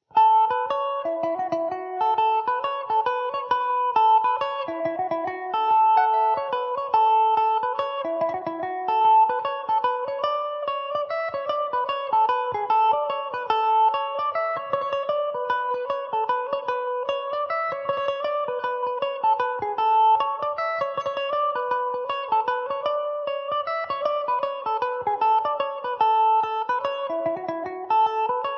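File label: A major mandobird, irish mandolin; The Top Of The Tree; Epiphone Mandobird VIII electric mandolin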